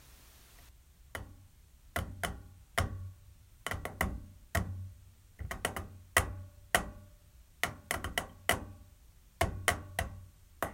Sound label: Fingers On A Radiator